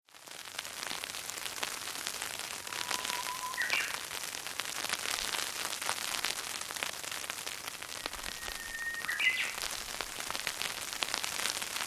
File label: hyo uguisu